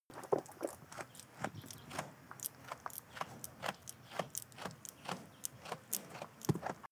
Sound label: goat chew